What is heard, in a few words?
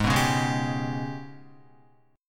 G sharp Minor Major 7th Double Flat 5th, Guitar; strummed; JGuitar